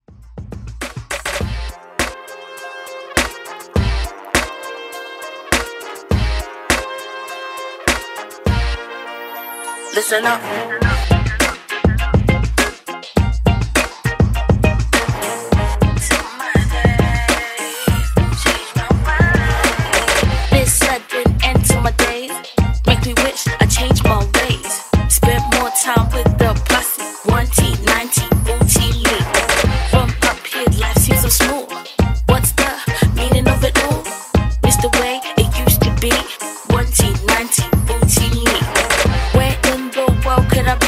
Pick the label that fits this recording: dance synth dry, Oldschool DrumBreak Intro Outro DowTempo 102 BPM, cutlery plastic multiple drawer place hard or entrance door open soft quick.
Oldschool DrumBreak Intro Outro DowTempo 102 BPM